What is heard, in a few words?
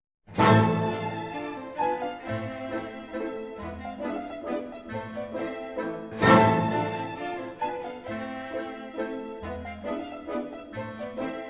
Mazurka, Orchestra; Fourth Way